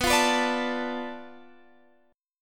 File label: D Minor 6th B, guitar chord